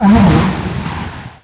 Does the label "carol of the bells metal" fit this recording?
No